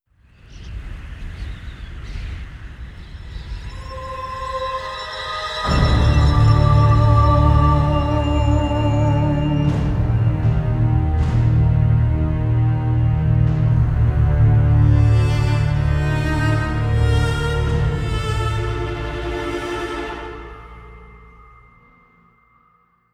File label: Round Start; Zombie Der Riese